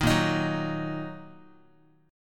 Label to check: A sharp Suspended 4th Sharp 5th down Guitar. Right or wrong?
Right